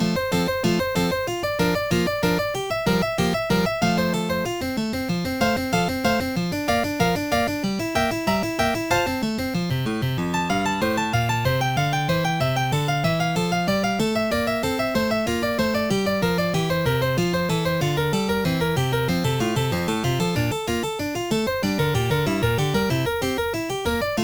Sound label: prelude; OctaMED MED